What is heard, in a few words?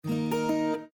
Print complete